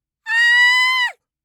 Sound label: kyaa; gaya